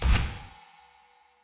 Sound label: upgrade